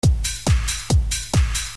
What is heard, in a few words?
CHASE